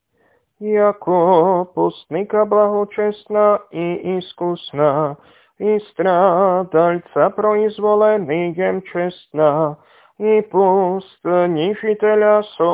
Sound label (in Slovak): Kondák prepodobnomučeníkovi, hlas 2; nedelna-skola